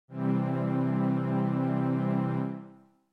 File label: C Major